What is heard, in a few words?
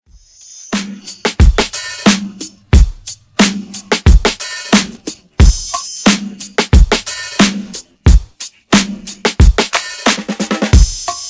90Bpm